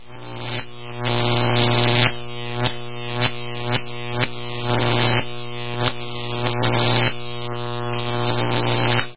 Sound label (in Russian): prodolzhitelnij zvuk elektricheskogo razryada